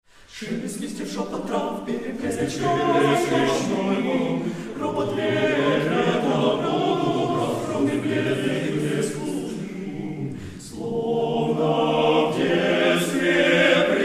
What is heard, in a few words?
hor Male Choir; Sergey Taneev; Konstantin Balmont